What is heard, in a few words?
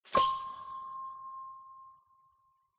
note C4